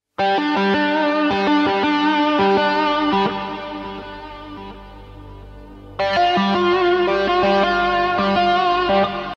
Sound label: guitar; mandalorian